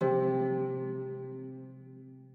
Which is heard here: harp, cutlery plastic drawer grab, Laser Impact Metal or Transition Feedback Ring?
harp